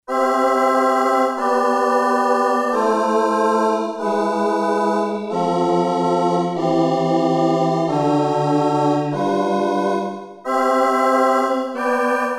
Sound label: Chorale, Roland SC-880; Factory Tones